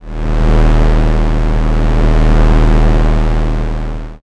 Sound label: space engine